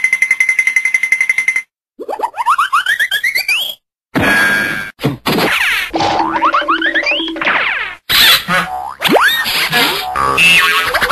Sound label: cartoons sound